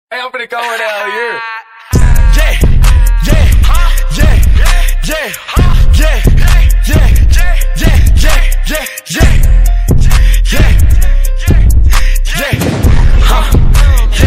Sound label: Yeah Yeah Yeah